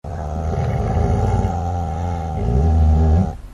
dog snarl

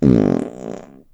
ogre fart

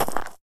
gravel, footsteps

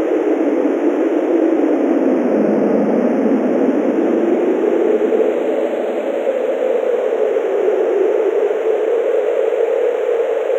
wind; stendhal; loop

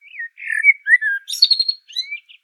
bird blackbird

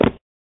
hit ground, footsteps

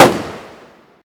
bullet rifle; ufoai; weapons

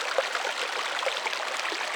water

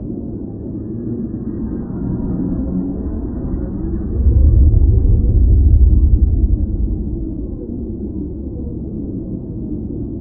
alien machinery

